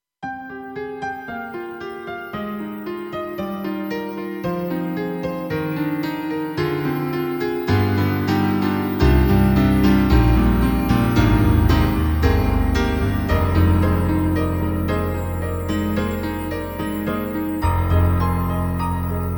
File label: Daydream Piano 2; M3 Version 1 Wave; KORG M3 XPanded